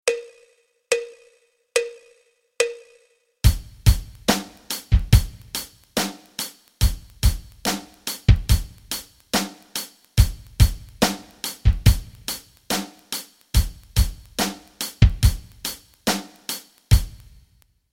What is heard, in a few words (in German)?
rock rhythmen